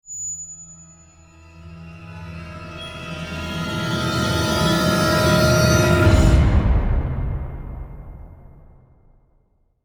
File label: Danger Countdown Stinger; The Walking Dead: The Telltale Series